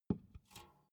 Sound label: big can filled put down soft